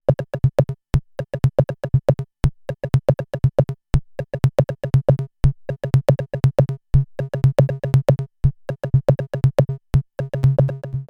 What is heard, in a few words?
randomRhythm Swing